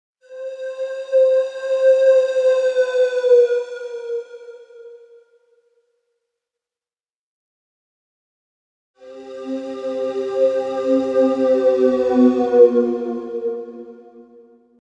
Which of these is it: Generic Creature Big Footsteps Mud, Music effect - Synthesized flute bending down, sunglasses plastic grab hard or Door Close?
Music effect - Synthesized flute bending down